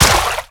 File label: Step Water; Warframe; Zealoid Prelate